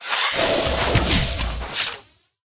bug

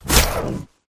Rat wound

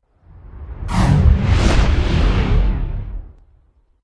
witch buff in

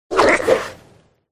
cat attack voice bottom

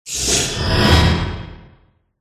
dragon mage death

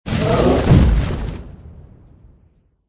golem attack voice bottom

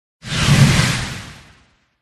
dragon warrior

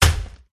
elf attack contact bottom

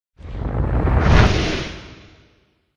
dragon boss buff

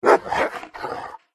dog attack voice bottom

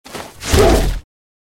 Tiger

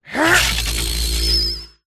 mage student attack voice bottom

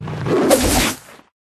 Tiger

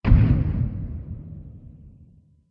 attack contact middle; orluphia

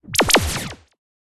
Light Spirit Block

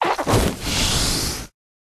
Wombat Die; pets; vombat

